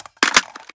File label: reload empty mag in, AR-15; Assault Fire